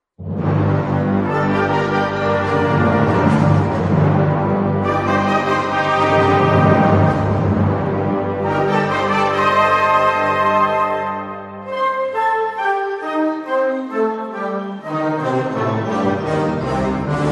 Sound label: Fantasy, clarinet